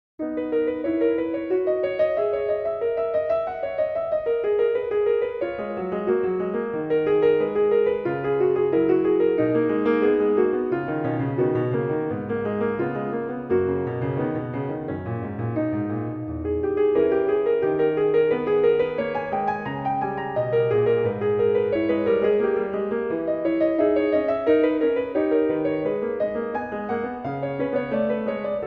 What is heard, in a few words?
Prelude in B Major; BWV 868; BACH; WTC I